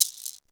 SHAKER

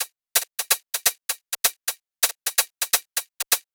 drum loop 128 Top; Anthemic

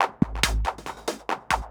PERCLOOP TEKNO 140; Techno2

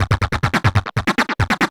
Industrial 140

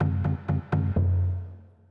PERCLOOP ETHNO 125

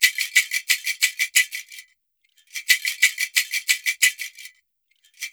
ETHNO PERCUSSION 90; ethno2

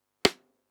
Snares & Claps, One Shots; Touch Loops; CosmicFunk

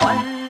ORG, DANCE ORGAN

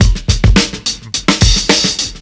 HIP 106, loop; 100-114 BPM

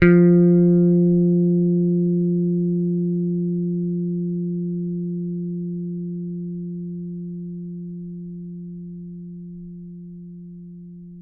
T-BIRD BASS PICK F3; Bass Mace; DirectWave

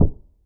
One Shot, Hits

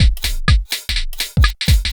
HOUSE BEATS 125

